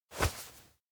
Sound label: forehead touch; koyomi; anemoi